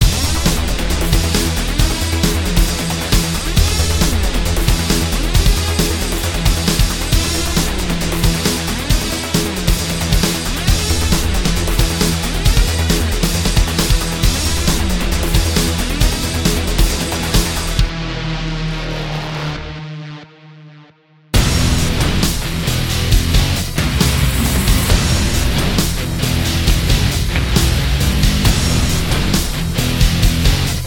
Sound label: Destroy; Stormrise